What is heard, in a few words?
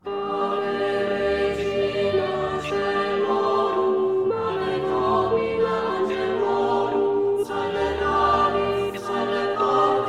Ave regina coelorum, alto